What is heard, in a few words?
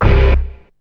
BASS; SoundFactory; SMPMANIA